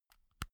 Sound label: insert usb c slow, elektronik; objekte